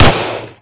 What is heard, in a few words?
colt 45 fire, weapons; Spearhead Demo; MacFormat 2003 June